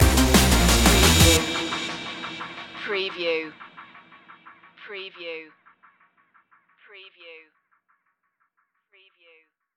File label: massive stinger